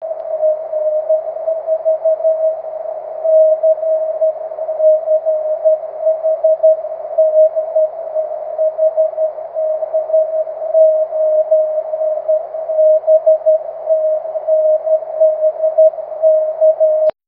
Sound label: cw; 23cm